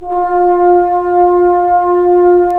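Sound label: brass trombones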